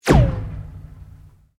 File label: Sweep Mechanical Fast